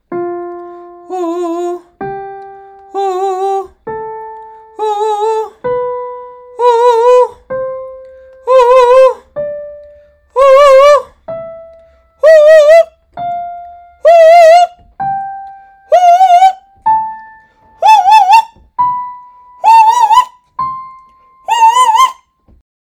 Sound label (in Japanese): 超高音; 後ろ上の超高音